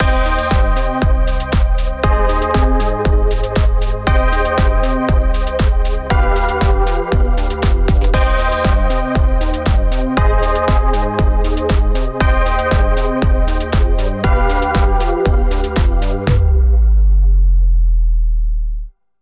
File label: Chords